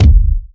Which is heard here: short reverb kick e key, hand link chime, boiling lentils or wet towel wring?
short reverb kick e key